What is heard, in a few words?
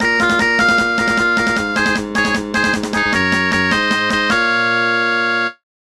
Game Over